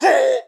Shield Damage; Pecheneg

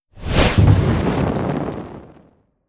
Logo

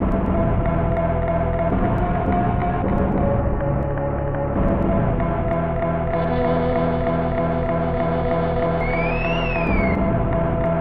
Whistle of Danger; Porky Pig's Haunted Holiday; SNES

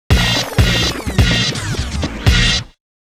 Winning Display Noise; Capcom Fighting Collection 2

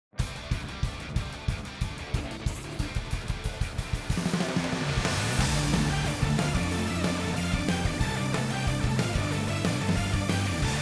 Battle Boss; Sanzaiten

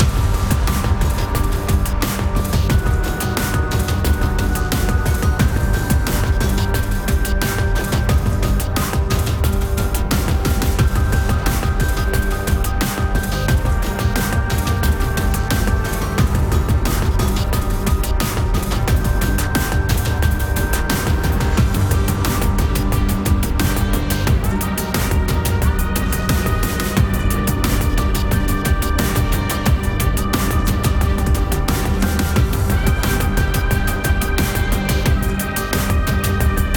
Battle

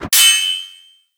Sword Whoosh Hit Sword Hard Mono

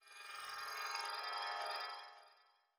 shard Shudder

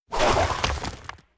deity vine attack

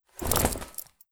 Armor Drop

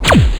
Plasma Bomb

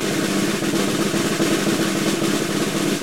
Drumroll; Burnout CRASH!; PS3; Xbox 360

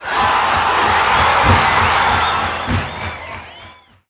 Huge jump Short

